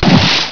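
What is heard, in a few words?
EXPLODE, Explosions; sound effect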